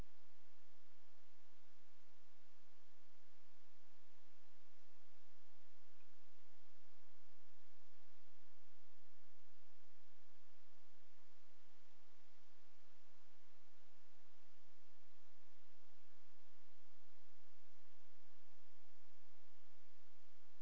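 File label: hydrophone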